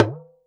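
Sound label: TALKING DRUM UP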